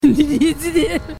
heegeehihgehgehheh

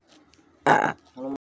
drink sound with mm

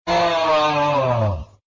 auug reverse

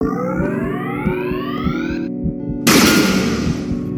charge gun; sonic

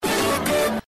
stinger

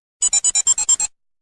digivice sound

